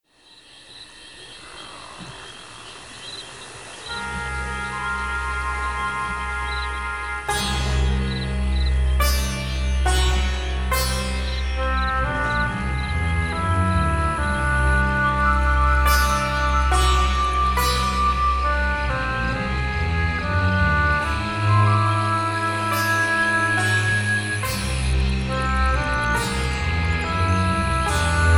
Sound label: Soundscape Version; Marsh Land; The Legend of Zelda Majora's Mask